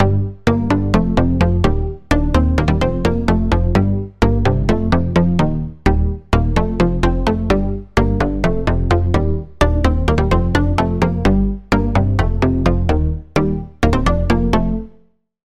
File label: Pluck, Sylenth1; Pluck - Bushido; Routed Sound; Sylenth1 Expansion - Noble Gangster Vol.1